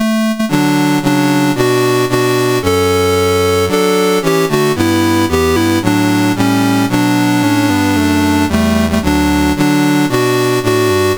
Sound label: CSG8580R5, National Anthems; South Africa